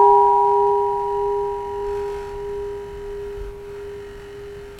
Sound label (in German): Glocke, Glockenspiel; Glocke 09